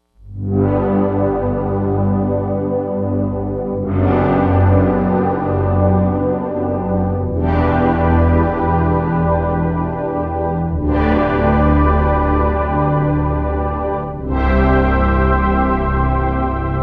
Mellow Pad; Digital Synthesizer

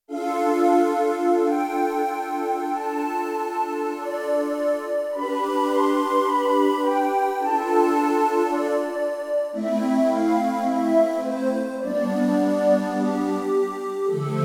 Roland D-70 Hyper Pad; Factory Performances

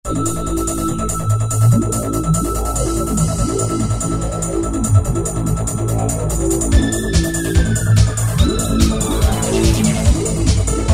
Goa Trance; Preset Styles

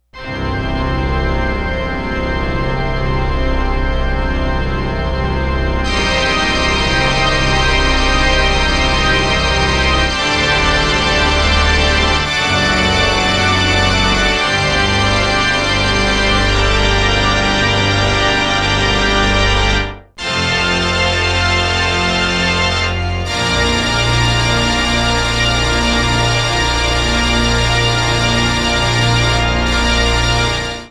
PIPE ORGAN

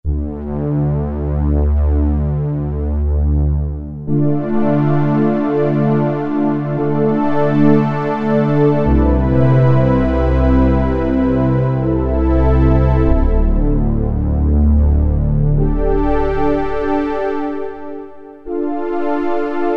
AM square synth, Kurzweil K2000; Kurzweil K2000 V3; Factory Programs

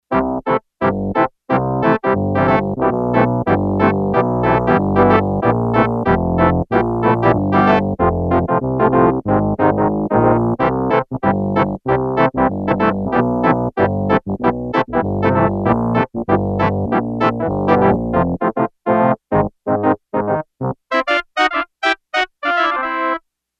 PuffOrgan, Yamaha TX802; Factory Patches; Voices

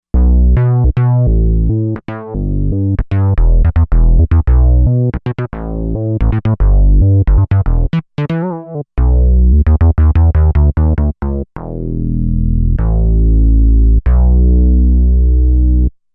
Bass Dry Bass

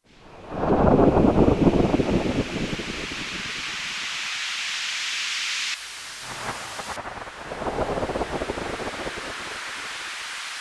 Thunder Storm, Kurzweil K2500X; Factory Programs

